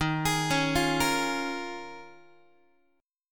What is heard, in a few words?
D sharp 9th Suspended 4th D sharp, Guitar; arpeggiated; x,11,11,11,9,9; 4A,4E,5A,5D,5G#,6C#; JGuitar